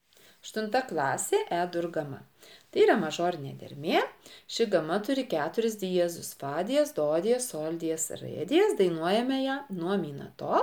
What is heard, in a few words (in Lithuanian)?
E-dur gama